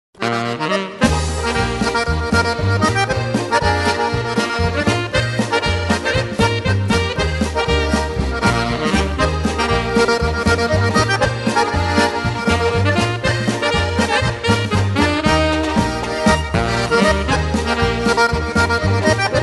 Polka, Button Box

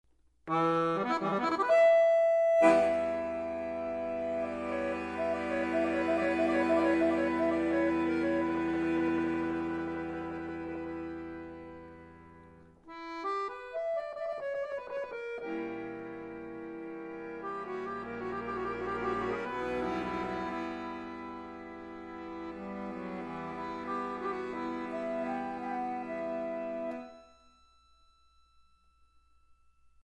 Gypsy Medley

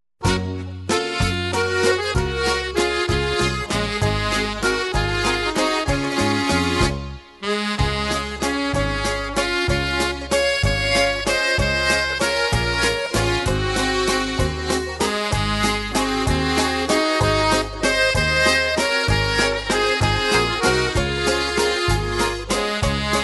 Waltz

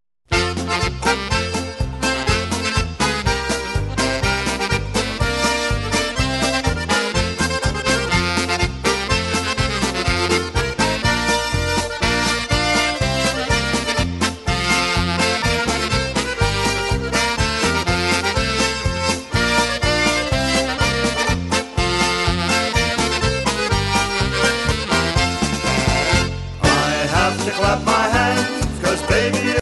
Clap Hands Polka

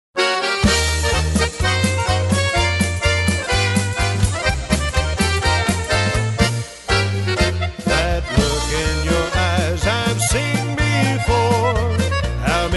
Polka

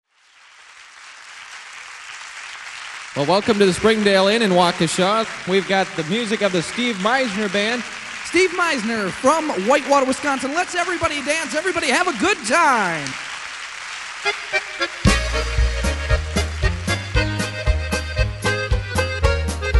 Polka

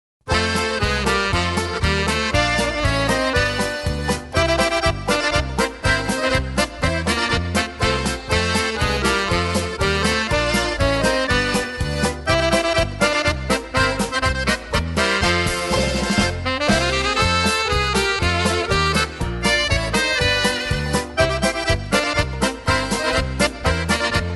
Polka

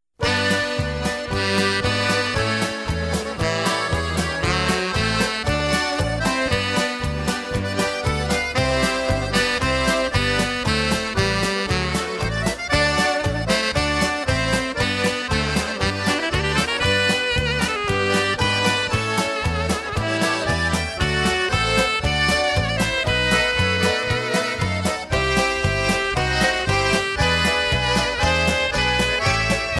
Polka

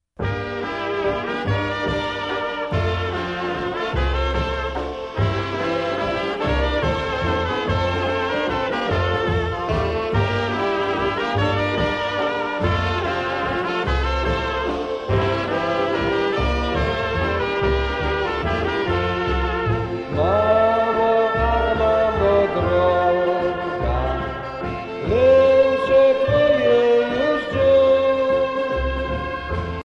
Waltz

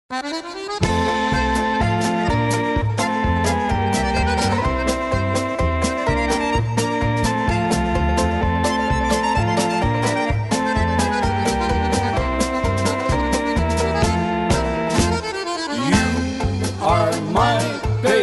Polka

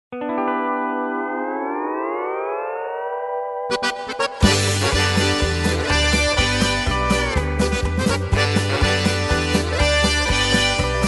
Hawaiian Polka

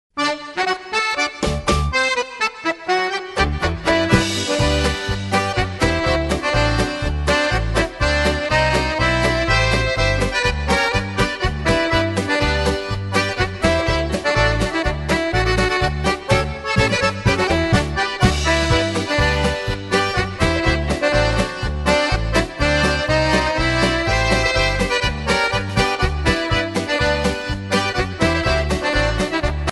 Polka

